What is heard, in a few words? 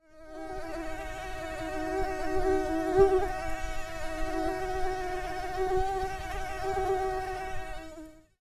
Mosquitoes buzzing